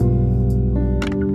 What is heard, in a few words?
Piano Pad; Pads; BB 2HTC Samples Vol 4